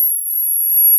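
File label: very short electronic buzz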